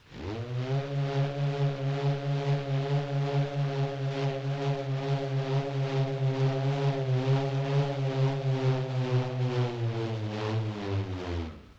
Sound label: LoFi Motor Whir Down